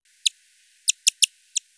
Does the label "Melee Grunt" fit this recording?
No